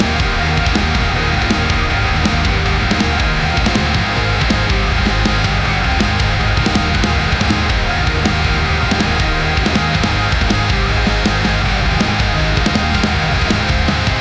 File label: fight music elecro; dota 2